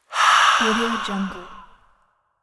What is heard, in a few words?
exhale